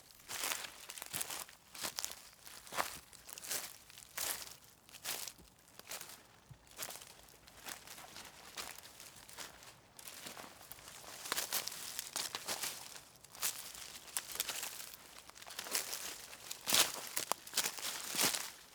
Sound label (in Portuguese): Passos na folhagem seca do cerrado; Colecao Sonora do Cerrado